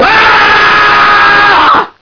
die; votemap

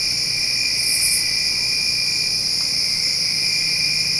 summer night

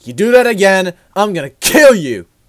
shot

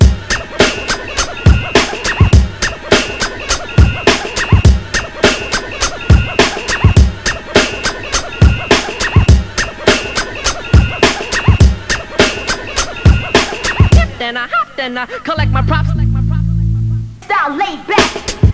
funk ride

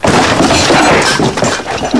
fall

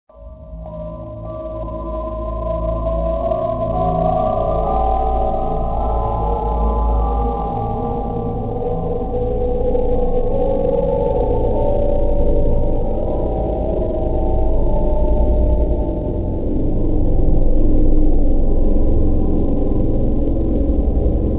ambience

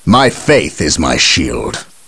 cheer; gabriel angelos

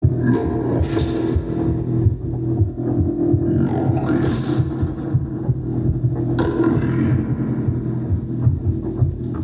Alien amb; Resurgence

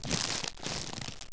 player step

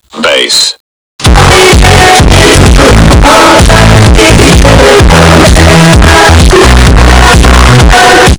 bass

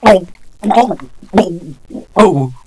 male water death; jcdenton

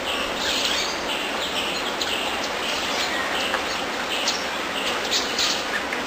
jungle loop

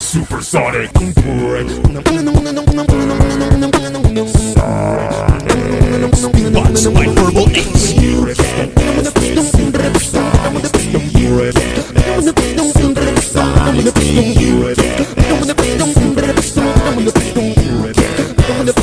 supersonic beatbox

